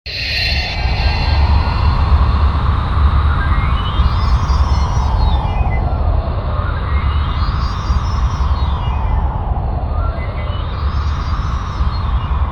computer wind

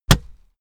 punch

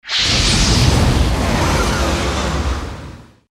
agressive swosh fat long

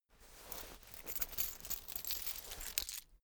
key ring large pocket fetch

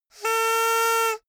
hoot

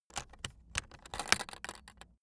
aluminium case open close

